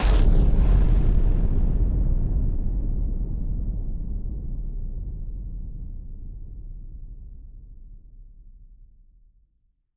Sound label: Noise Bomb Rumbler; musicradar; bomb factory